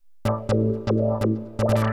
synth house 125 A; eJay; Keys

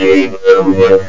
game over; protris 1.0

